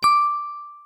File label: combo break